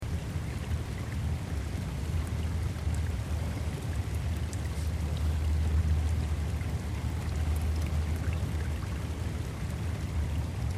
Light Rain And Frogs, Drizzle of rain in swamp or marsh area; Drizzle of rain in swamp or marsh area, with frog chorus. Very distant city rumble with faint sirens.; Rain Sound Effects